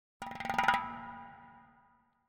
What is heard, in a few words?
Drums Short Drumming - Very Fast Hits - Thin Drum; Hollywood Edge; sound designer's toolkit v2